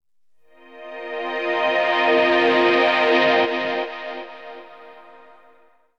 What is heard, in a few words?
SYNTHPAD AMBNT 160 C; Ambient; Sphere